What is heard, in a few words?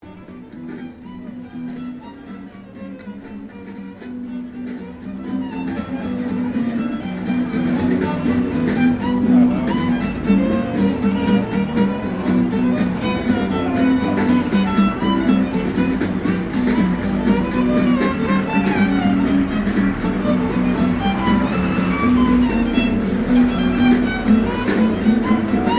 Ambience Melbourne Busker Violin; Very cool Violin busker inMelbourne city; Concert Sound Effects; Foundation1